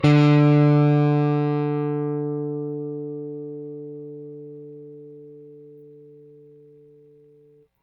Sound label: Rickenbacker Bass; Airwindows